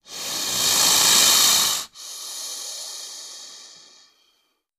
Snake, Bull Inhale Then Exhale. Close Perspective.